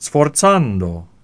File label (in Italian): sforzando